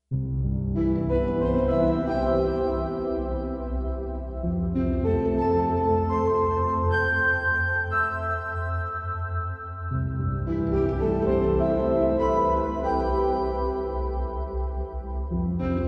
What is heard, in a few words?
Hit; Elysion 2; Venus